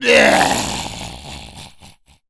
zombie death

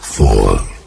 button click release; ui

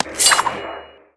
sword equip; arkane; character sheet; souldrinker

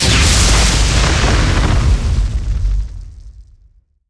magic spell fire destroy; arkane

